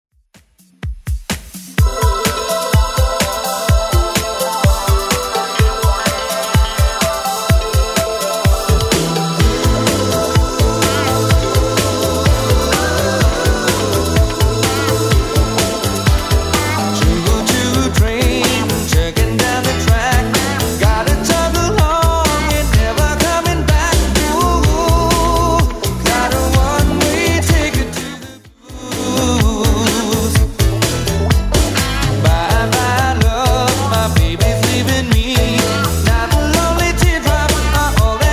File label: 60s Redrum 126bpm